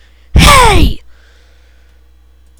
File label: groan